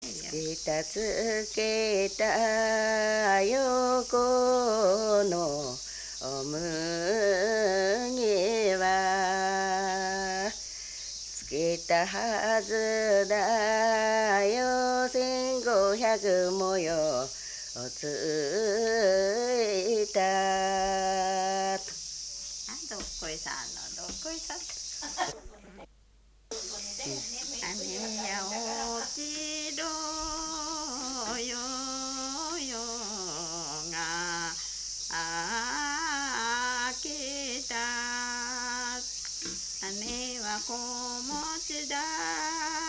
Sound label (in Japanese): mugitukiuta, minyou